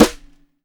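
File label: jazz drum snare drum; AKAI S6000 CD-ROM - Volume 1; DRUM RYTHM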